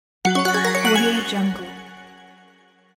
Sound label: open magic chest; studio j